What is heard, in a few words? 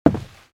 step; sahur